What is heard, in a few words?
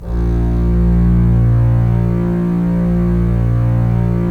STR, Roland LA Composer